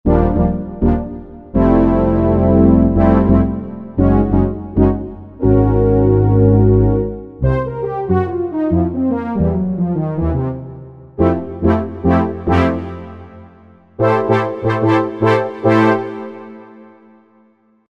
3 Osc Brass, Roland JV-2080

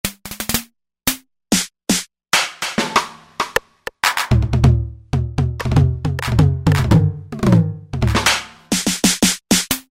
Snr & Tom, Roland V-Synth; Factory Patches; 250 Snr&Tom Menu

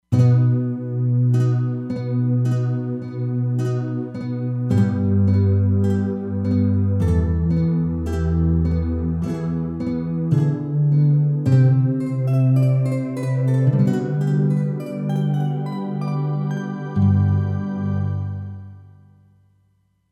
Nylon Pad, E-mu Proteus-3; Factory patches

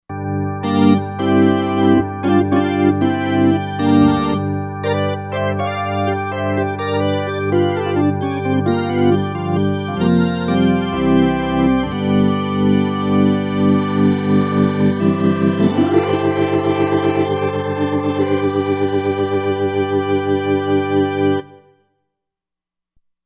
StakDraw Org, Roland Fantom XR

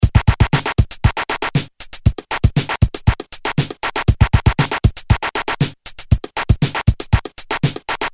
Electro, Korg DDM-110

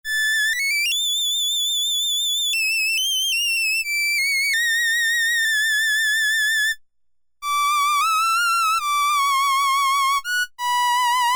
violin I, Korg M-500 micro-preset

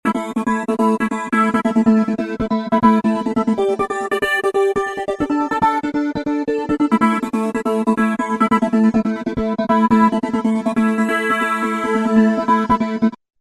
Sliced Vox, Roland V-Synth; Factory Patches

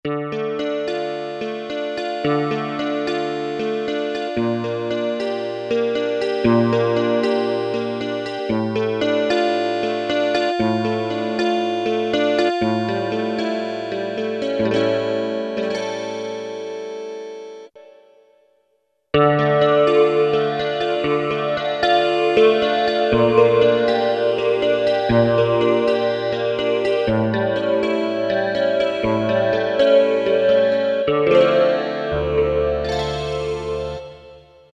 3D PHASE REV, Boss SX-700; Factory Preset

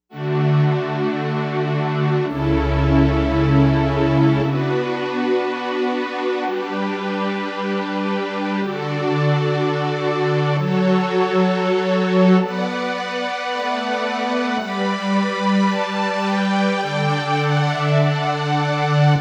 Legato Strings; Novation UltraNova; Factory Patches

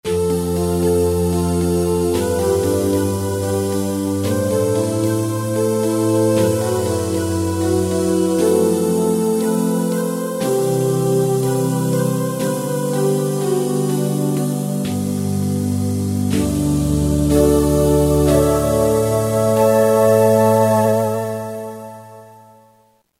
Outhere Pad; Roland M-DC1

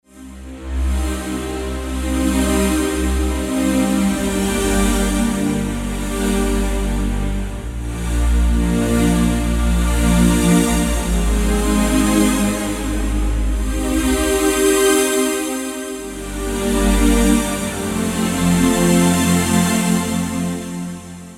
Kawai K5000S; AttakStr

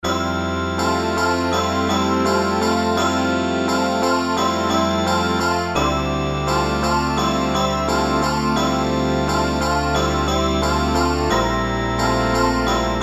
EWAVE

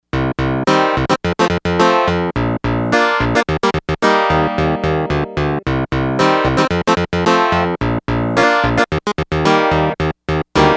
Easy Clav, Yamaha DX100; Factory Patches

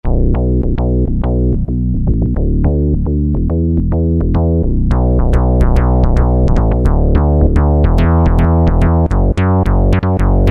Synth Bass 2, Roland Alpha Juno-2; Factory A

